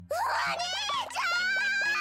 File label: cry; umaru